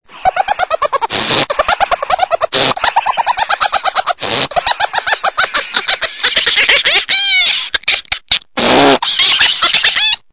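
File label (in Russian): smeh, laughter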